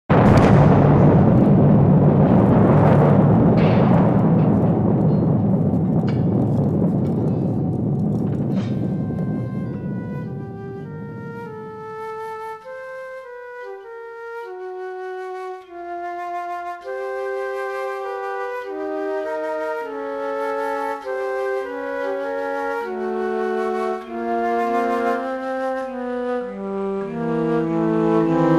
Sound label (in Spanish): Explosion y Cantis